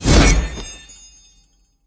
projectile; smite; olorun

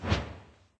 swing slow; smite; god odin

